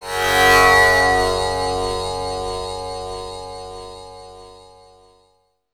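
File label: tampura drone; Spectrasonics; Heart of Asia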